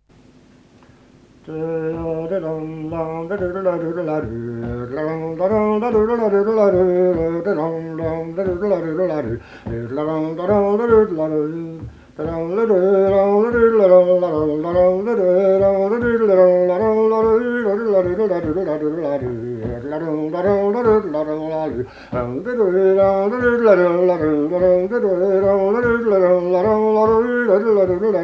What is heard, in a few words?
Polska; efter farfar Gammel Ol Zackrisa